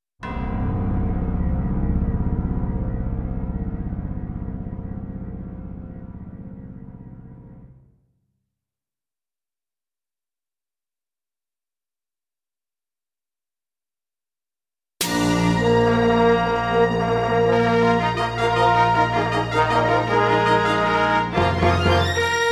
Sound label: Intro, Roland Edirol SD-20 GM Contemporary; Dark Forces